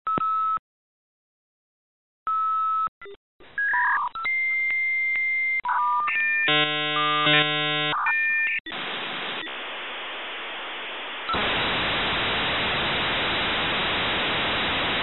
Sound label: modem